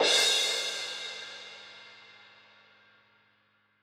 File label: Crash Bliss; Percussion; Cymatics - Infinity Beta Pack 2.0; Zeus Percussion Collection